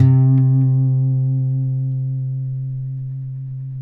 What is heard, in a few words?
Finger C 3 R, Guitars; Spectrasonics; Hans Zimmer Guitars VOL-1